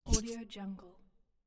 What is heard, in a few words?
game interface sound; stormwaveaudio